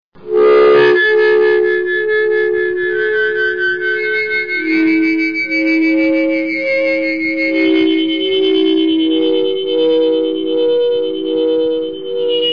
orgao

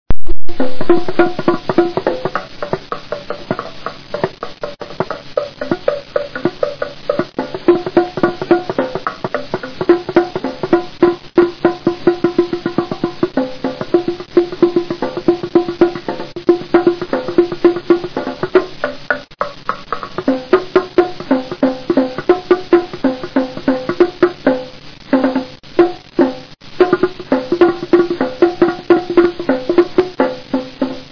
percursao

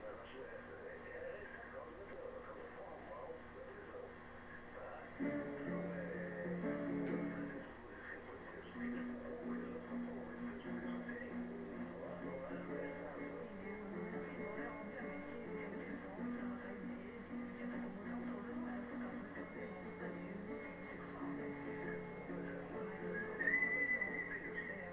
pequena musica com assovio

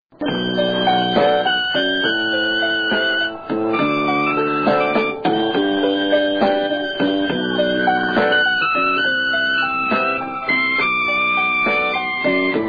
teclado diferente

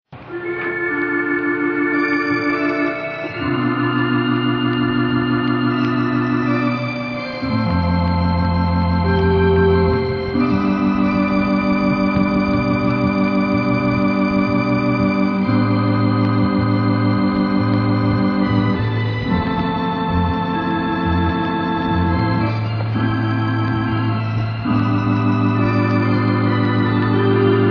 teclado lento

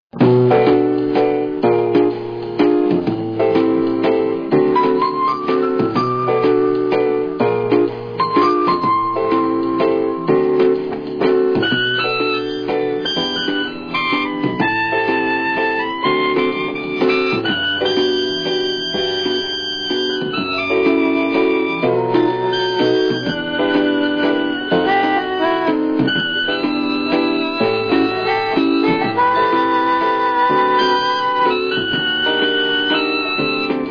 ritmada

